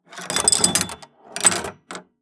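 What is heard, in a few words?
Drain, pinball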